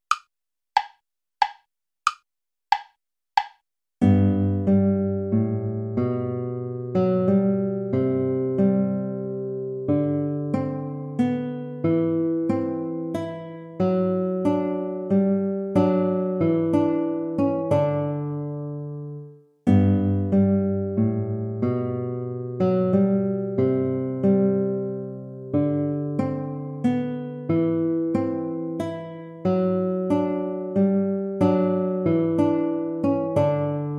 sarabande play along

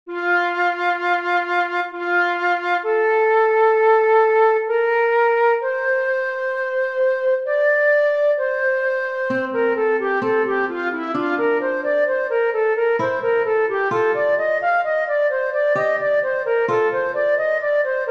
for flute guitar cello; bach johann sebastian prelude gottes sohn ist kommen

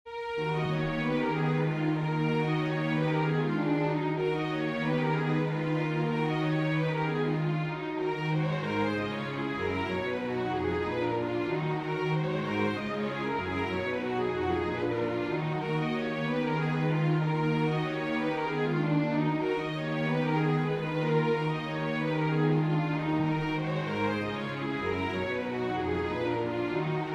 mazurka minor for string quartet; chopin

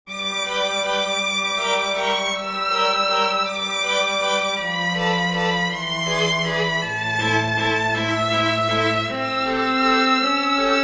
for string quintet; tchaikovsky piotr ilitch; the organ grinder sings

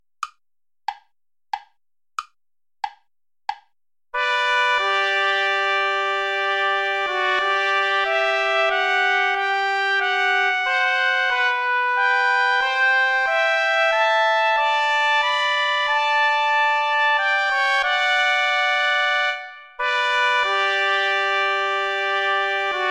sarabande play along without trumpet

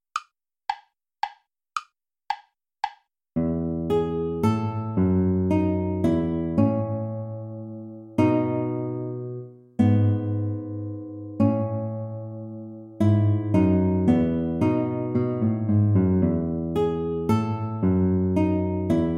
minuet major play along

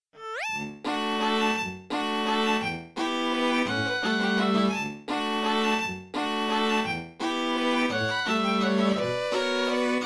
christmas waltz for string quartet

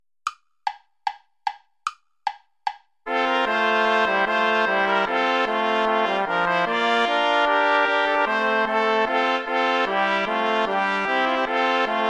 bourree play along without trombone; praetorius michael iii

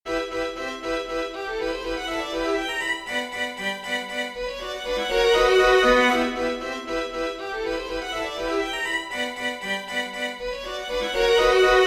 string quartet; Fairy Dance; Lyric Pieces

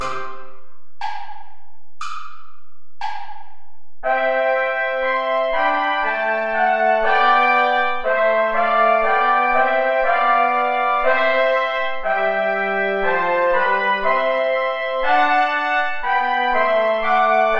play along without trombone; lobet den herren alle die ihn ehren

